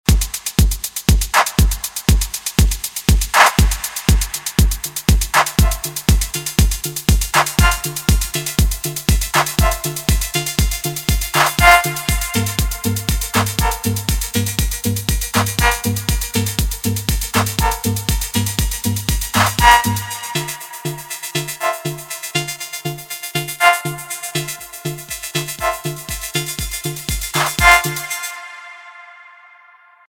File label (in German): Vokoder Flanger Delay